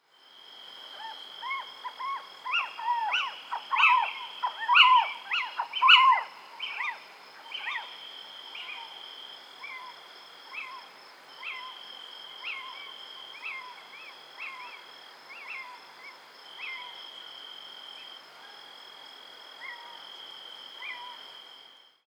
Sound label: Athene noctua call